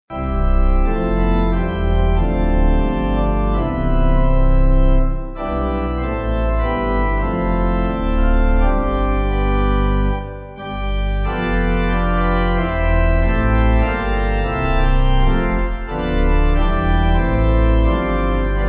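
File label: Pipe organ, church music backing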